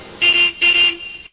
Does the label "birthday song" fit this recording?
No